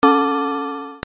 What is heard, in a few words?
BELLS